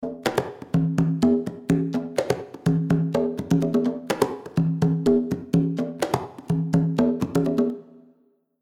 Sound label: Reverb ER On Room